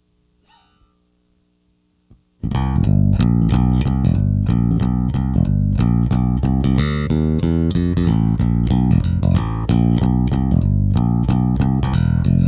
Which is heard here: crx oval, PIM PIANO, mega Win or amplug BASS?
amplug BASS